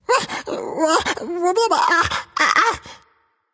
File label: nv confused; cupid